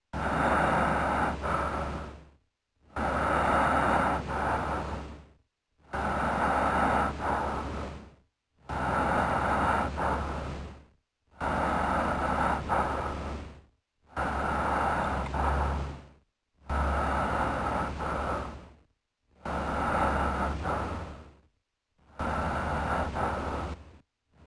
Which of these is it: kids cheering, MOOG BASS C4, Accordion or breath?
breath